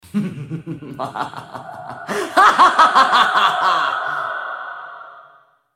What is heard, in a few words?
laugh; Black Ice Anivia